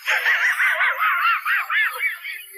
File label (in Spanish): risa de perroooo